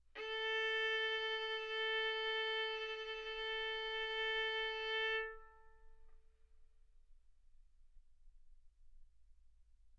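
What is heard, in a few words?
Violin arco mf A string A4 B4 stereo; sul A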